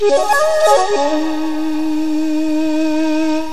flute

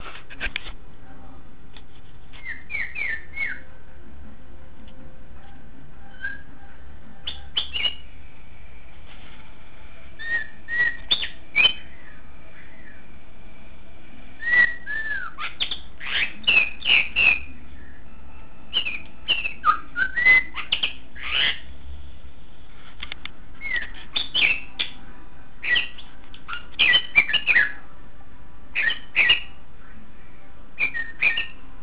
soft singing

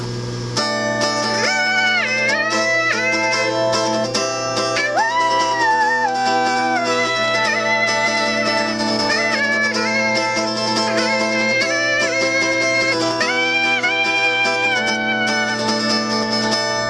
oooaaa

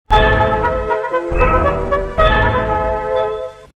chime

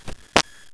fart

